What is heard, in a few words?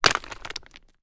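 stone medium large down on others hard; foley; zapsplat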